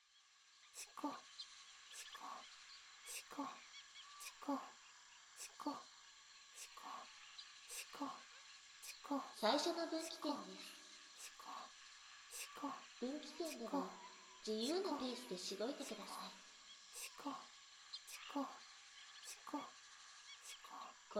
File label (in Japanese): ららのハード耳舐め; クチュ無し版; 淫夢の森#3面; 導き淫夢